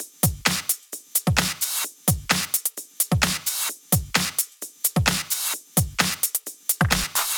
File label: 128BPM Drums; Vengeance Samples; Machine Kit